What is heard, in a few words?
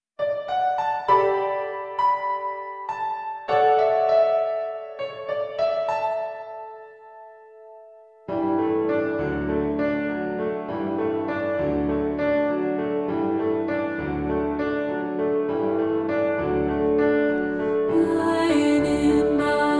Balanced Voices